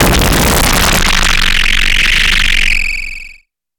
glitch factory decimated; sonniss gdc

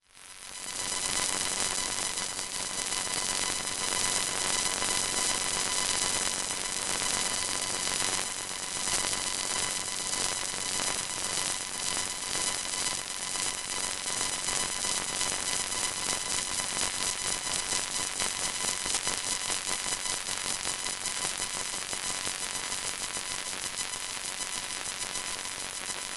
flowing current; sonniss gdc

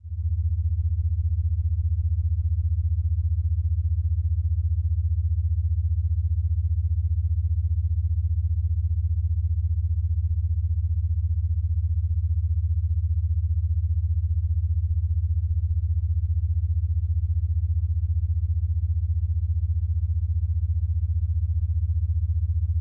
75hz monaural 15hz beta waves; sonniss gdc

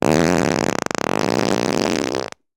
fart long; fienup; sonniss gdc

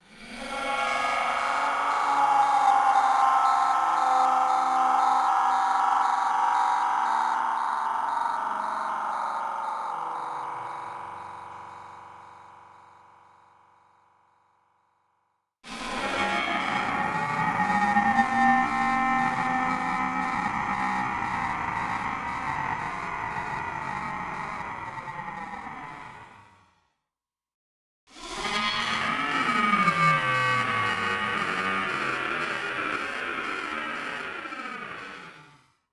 digital scream plugged in variations; sonniss gdc

